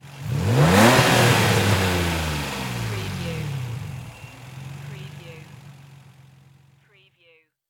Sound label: NISSAN 350Z ENGINE SINGLE REV